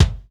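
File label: BD, drums; East West; Global Sounds; SPD11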